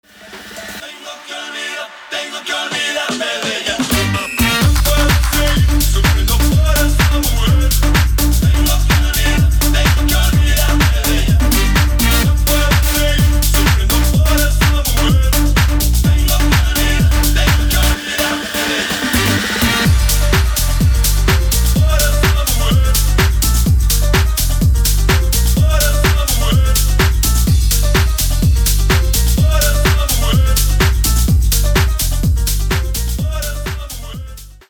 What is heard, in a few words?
latin lovers